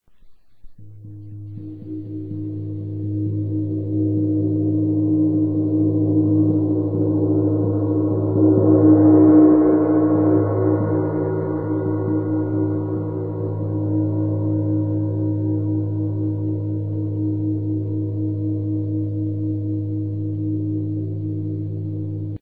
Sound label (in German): tamtam, Gong